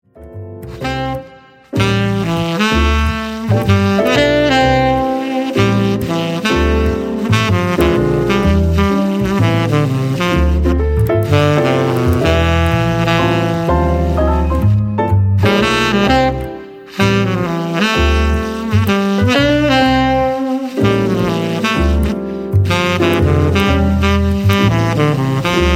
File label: jazz, jazz swing bands